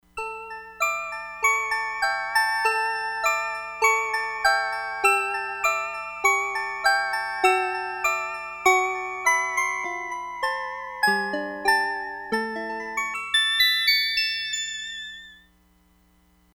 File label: SYNTH BELLS, Crumar Bit 99; Factory Programs